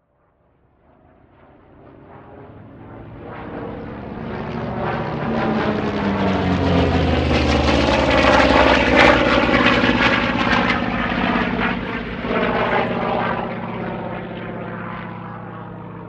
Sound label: Mosquito dive flyby